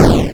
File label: fireball